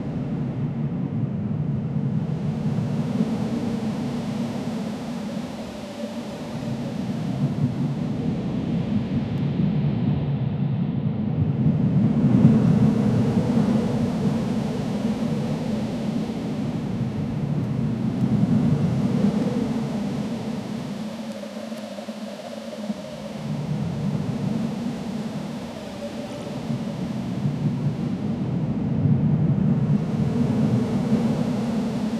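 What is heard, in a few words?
2000s animated movie style, desert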